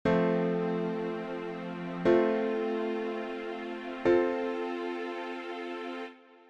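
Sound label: F Major Chord All Inversions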